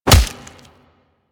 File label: Melee Fist Impact Flesh; Warframe; Weapons; Fists